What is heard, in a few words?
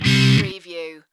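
Distorted Guitar Chord C minor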